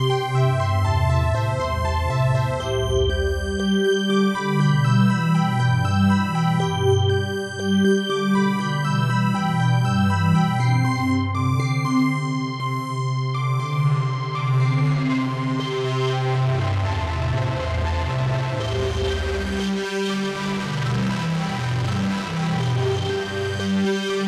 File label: Synth Fuzz; NTS-3